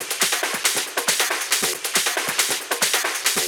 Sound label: Outlaw Kit 138BPM Loop, Drums & Perc; Gminor